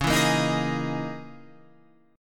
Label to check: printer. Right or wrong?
Wrong